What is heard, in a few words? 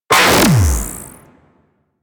Charge Gun Shot B; Crp Charge Gun